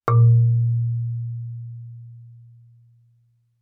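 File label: Marimba yarn fortissimo Bb2; MIS Pitches - 2014; Percussion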